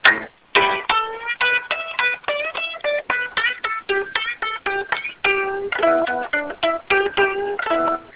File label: blues